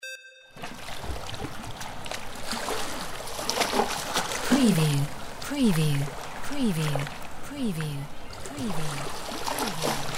SEA ROCKS; windy beach audio

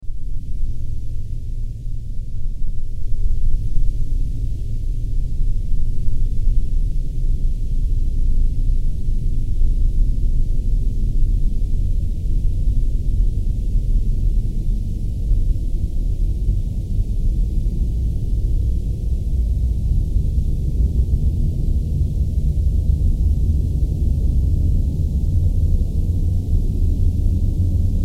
Sci-Fi SFX

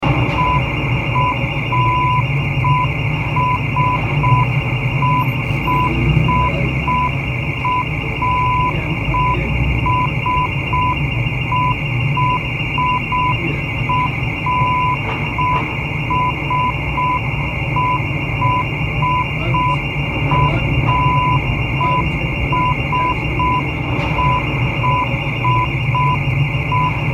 Burglar Alarm Window Shut

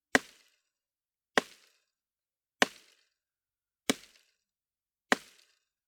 Cutting Woods

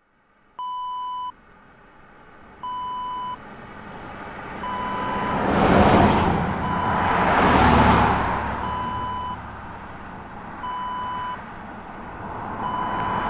cars pass by

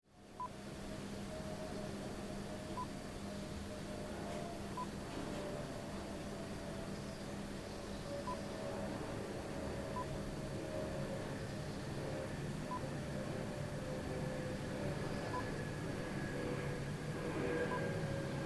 Bathroom Ambience

